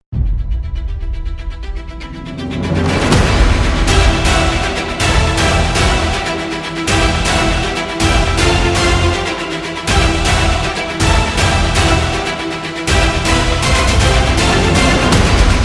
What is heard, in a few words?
Epic Fight